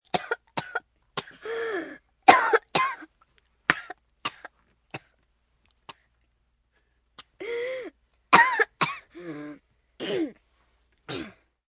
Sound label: Cough Gasping, Cough, 1 child, long, wheezing, gasping, human voice, kid; Sick Sound Effects; Sick (Sound Effects:Human); VoiceBox; Twistedtracks